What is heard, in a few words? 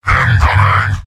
mortar; fat soldier; phase2